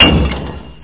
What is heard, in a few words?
HAMMER; eldritch